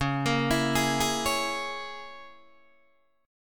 Db Minor 6th Db down Guitar Open D

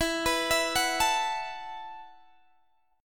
E Suspended 2nd Suspended 4th, Guitar Open D; down

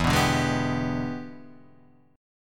E 7th Sharp 9th, chord; E7#9 strummed; JGuitar

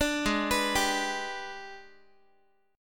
G sharp Minor Major 7th Flat 5th, Guitar; G#mM7b5 arpeggiated; 17,16,14,17,x,x

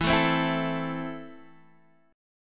E Suspended 4th Sharp 5th E; down

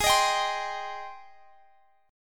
Ab Major 7th Ab down Guitar Standard; AbM7 strummed; JGuitar

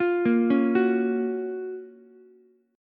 Gb Major 7th Gb Ukulele; GbM7 arpeggiated; JGuitar

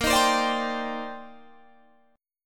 C Minor 9th Bb Guitar Standard; Cm9/Bb; strummed

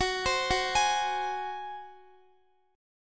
F sharp Suspended 2nd Flat 5th, Guitar; arpeggiated; x,x,16,17,18,15

